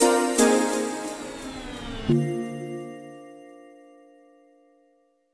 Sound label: you lose; Wii Sports